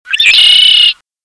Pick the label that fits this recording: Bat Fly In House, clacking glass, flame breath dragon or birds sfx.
Bat Fly In House